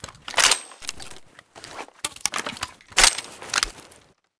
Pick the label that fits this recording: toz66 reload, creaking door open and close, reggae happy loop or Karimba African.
toz66 reload